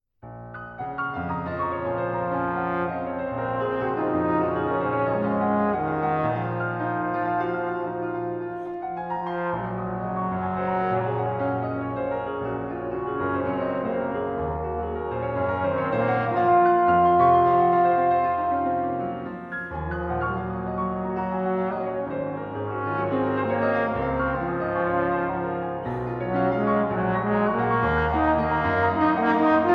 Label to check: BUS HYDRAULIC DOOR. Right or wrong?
Wrong